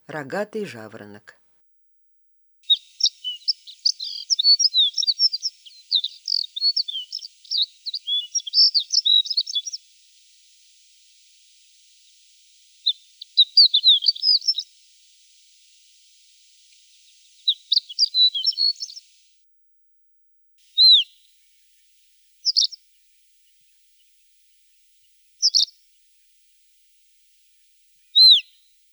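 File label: horned lark voice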